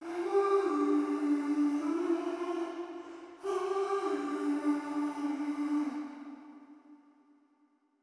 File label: Soul Vocals Am (Wet) 140 BPM, Melodies; 99Sounds x Monosounds